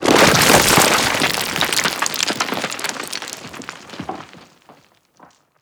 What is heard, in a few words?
debris detpack cement; Explosions